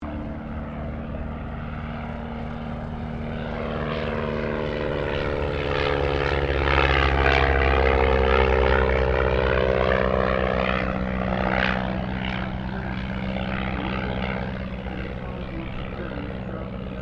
Aircraft Dehavilland Tigermoth Pass Commentary, RAAF Tigermoth biplane training aircraft